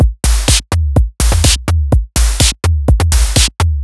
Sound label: 125bpm, House Drumloops